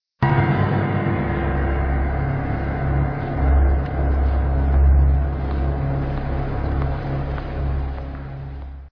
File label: creepy